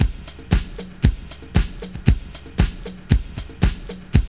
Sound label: DISCO LOOP; Zero-G; Funky Elements